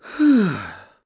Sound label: Sigh; Ghirahim sighing and swinging his hair; Skyward Sword